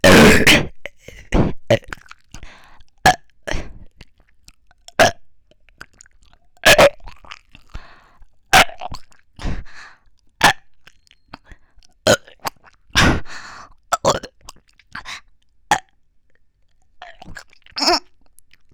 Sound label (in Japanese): ゲップ祭り ASMR ゲップのみ L; 実演; ハイレゾASMR